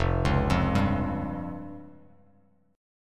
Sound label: F Suspended 2nd Sharp 5th down Bass Standard; Fsus2#5 arpeggiated; JGuitar